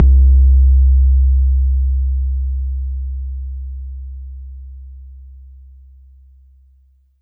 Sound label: BD; TRX